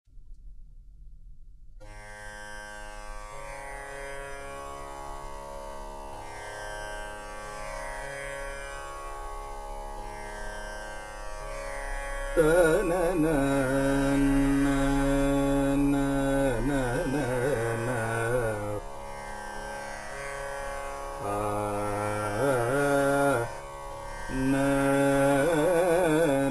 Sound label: Tamboura Tanpura tampura